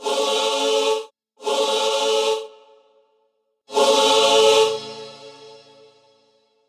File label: Choir Aah